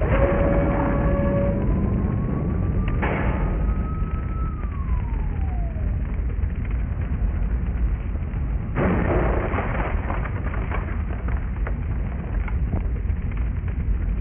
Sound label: Intro Loop; beast bash beware